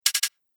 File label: Metal Triple Rattle, dong sound effects